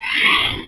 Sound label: roar; The Elder Scrolls III - Morrowind